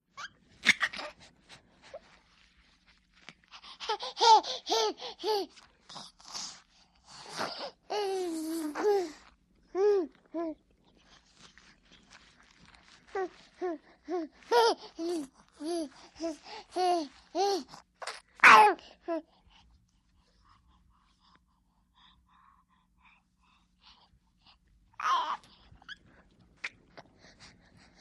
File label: Happy Baby Girl Plays, twelve month old